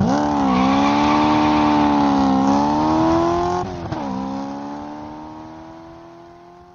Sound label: burn car; BALLY